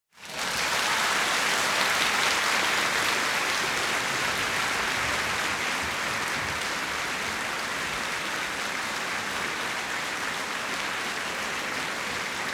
Applause - Large Crowd - Shorter Interior Music Hall Orchestra; Hollywood Edge; sound designer's toolkit v2